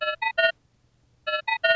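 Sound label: Oxygenation Emergency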